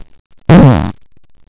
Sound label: fart